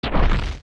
Giant Rock Lift

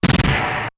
Unlock; Unlock a dungeon door

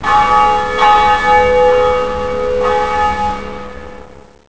Town Bell; Hyrule Town's bell ringing

